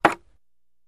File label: Staff Hits Medium, spears & staffs